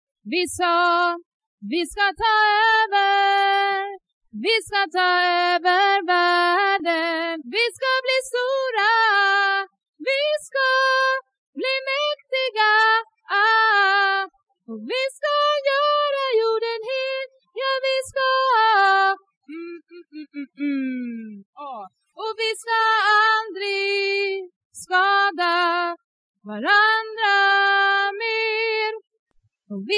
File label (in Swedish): bas